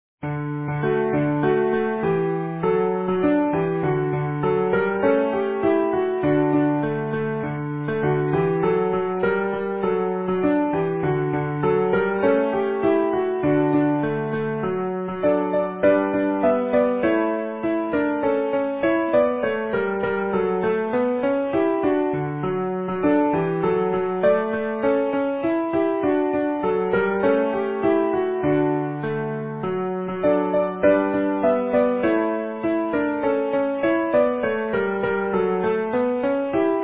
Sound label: Easy Piano